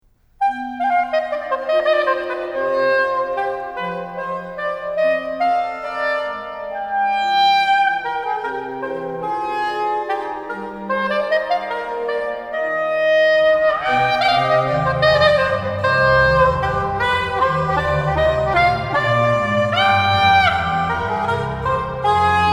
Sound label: Bouree anglaise Oboe Sonata in c; buxtehude